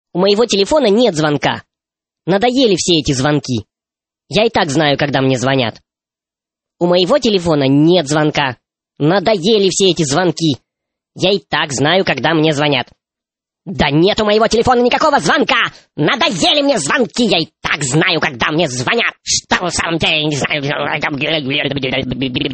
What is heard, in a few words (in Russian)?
telefon bez zvonka